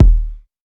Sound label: 2000s reverb kickdrum d sharp